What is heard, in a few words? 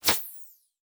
Multimedia Internet CD-Rom Flash Short